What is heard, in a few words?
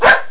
Dog Bark; Jim Heid’s Sounds